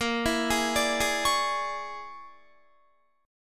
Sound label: Bb 7th Sharp 9th Flat 5th Bb, Guitar; Bb7#9b5; arpeggiated; JGuitar